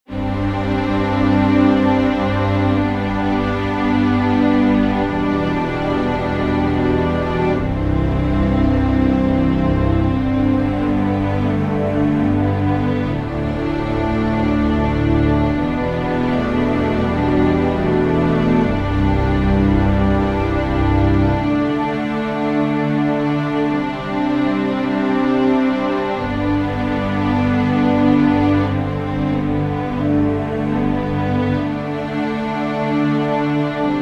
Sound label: Underscore PAD; Worship Flow